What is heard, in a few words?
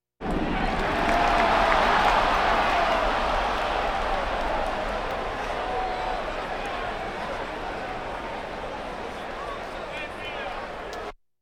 Crowds Race cars Baseball